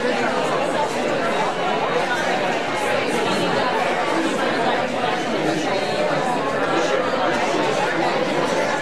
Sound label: Talking Noise Loop